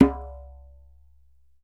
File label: DJEMBE, African Drum